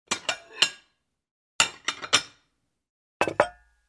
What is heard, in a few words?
plate, tableware